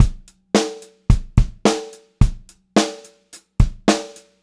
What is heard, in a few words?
POP BEAT, Pop-Rock Drumloops; 108 POP